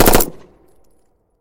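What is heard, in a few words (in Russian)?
long machine gun burst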